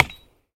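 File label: metallic knock with an axe on a stump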